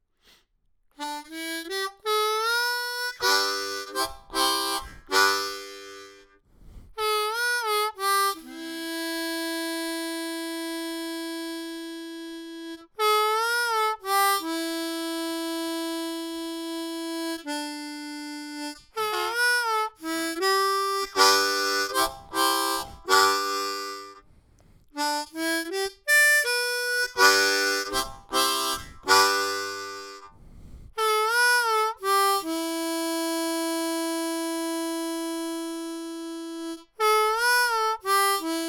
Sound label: Rode NT1 A blues